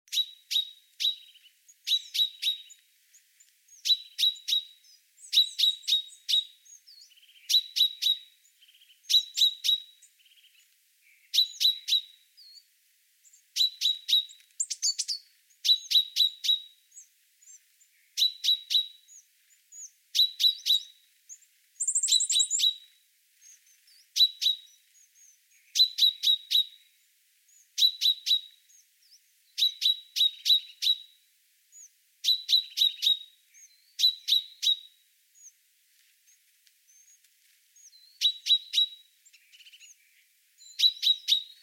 Fringilla coelebs; SETE

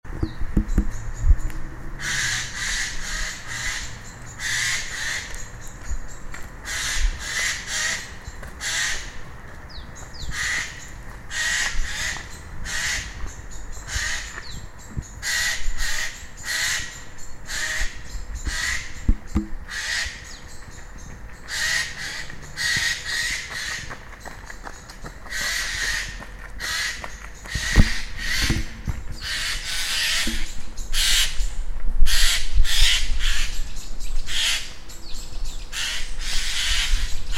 Garrulus glandarius; SETE

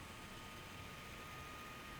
pulses, BALAENOPTERA; MONACO 2022-07

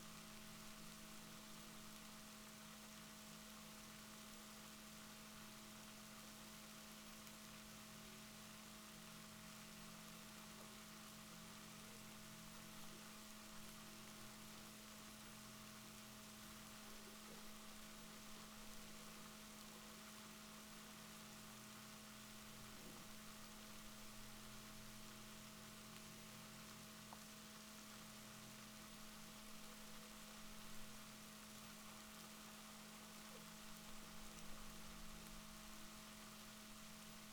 rorquals positif, Balaenoptera Physalus Chile

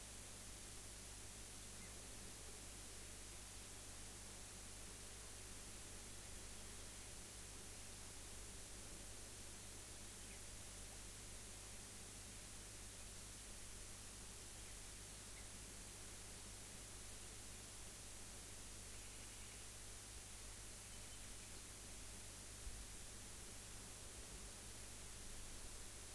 rayol farm; SEAMED

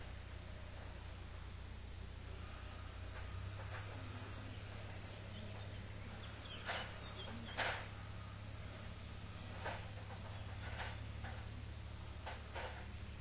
rayol farm; SEAMED

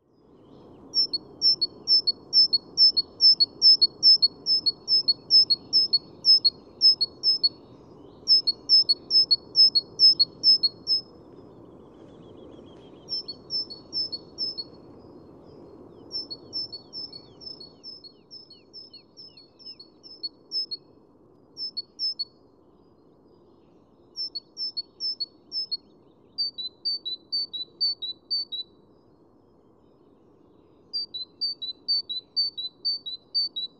Parus major; SETE

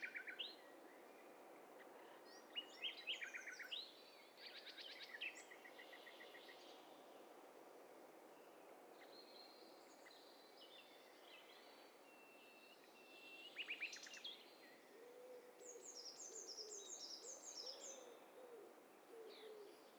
STEREO BIRD COCKTAIL PARTY

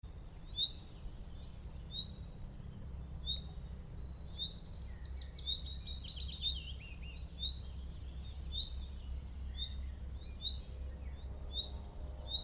Luscinia megarhynchos; SETE

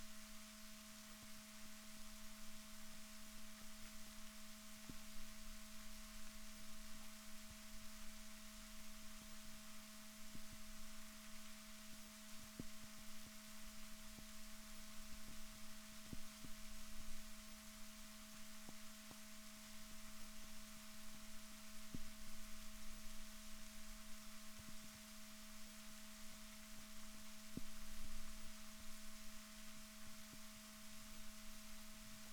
rorquals negatif, Balaenoptera Physalus Chile

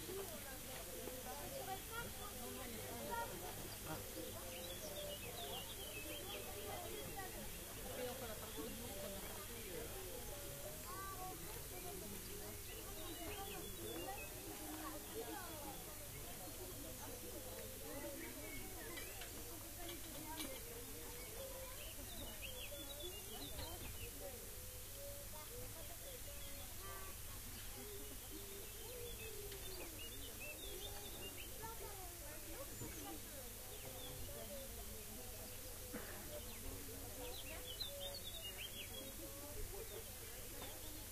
rayol farm; SEAMED

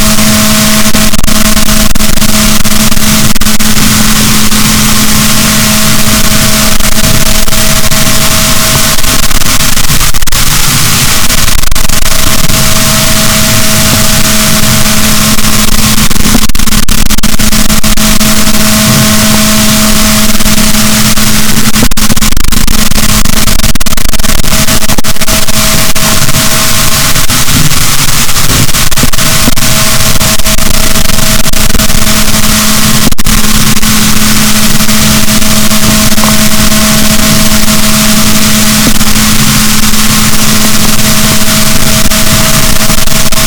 rorquals negatif, boat

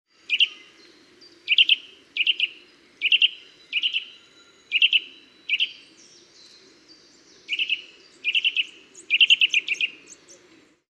Sitta europaea; SETE

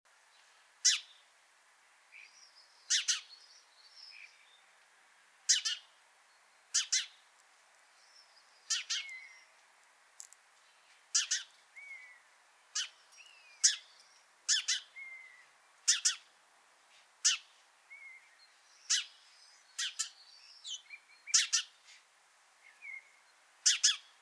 Passer domesticus; SETE

